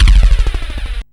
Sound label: Arcade Riffs